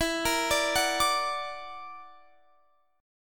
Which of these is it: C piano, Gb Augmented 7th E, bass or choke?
Gb Augmented 7th E